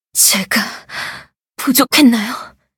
hit; cookie run

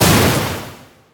skill sea explosion; agar agar; Cookie Run

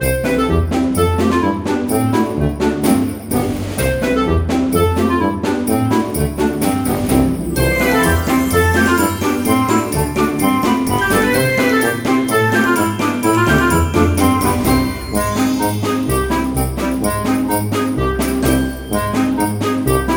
BGM Squirrel Shop

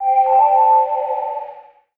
ghostmode start; cookierun

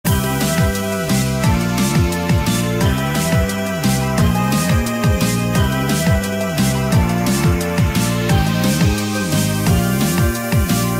Bonus Night; Cookie Run

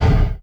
ghostmode pass

